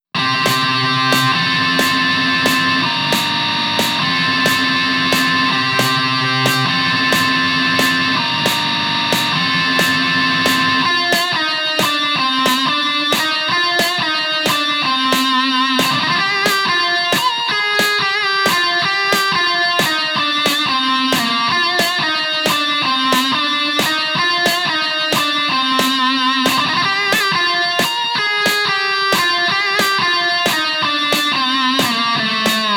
Main (Guitar Only); Captain Falcon's Twister Race

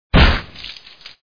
Hit

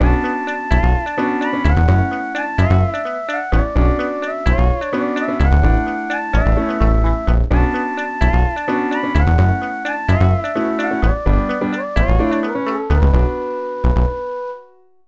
Disco

